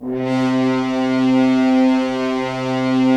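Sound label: Solo French Horn, brass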